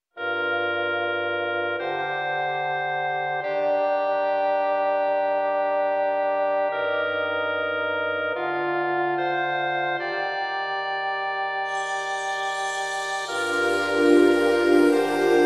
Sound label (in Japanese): fue